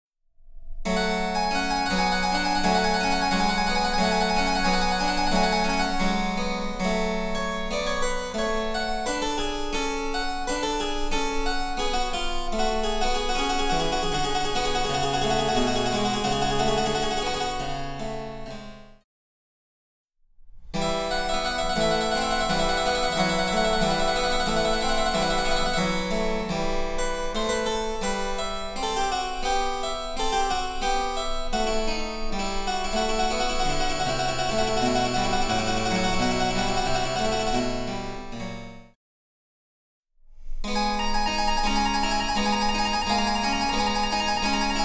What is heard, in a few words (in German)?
Cembalo; Mark Lindley WTC 1